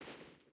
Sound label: footstep barefoot sand walk; Rust